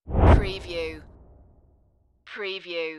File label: Wipe